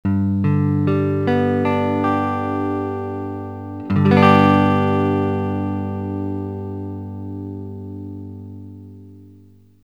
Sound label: g major, guitar